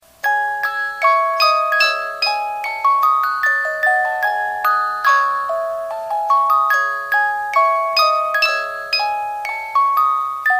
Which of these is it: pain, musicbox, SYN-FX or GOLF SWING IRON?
musicbox